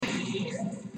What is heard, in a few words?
ehehehoo